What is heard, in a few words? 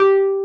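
Wurlitzer electric piano G4; Sonic Reality; Vintage Timetraveler; WURLITZ EP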